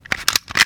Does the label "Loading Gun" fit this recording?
Yes